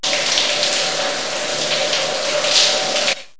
dusche

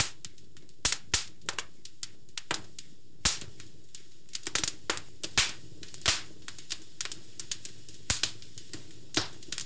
kamin

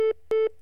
PIEP

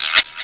frosch; ActionCat

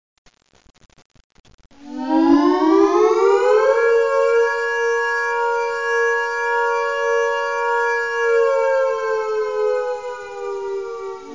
sirene

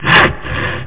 Säge; freetrading

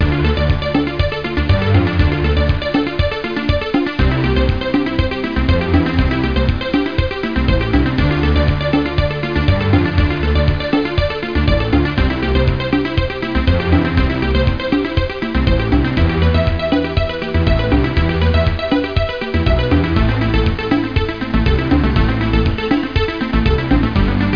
musik

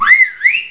Pfeifer; Skat 3.0 Demo

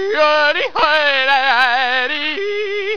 JODEL

